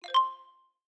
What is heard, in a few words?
notification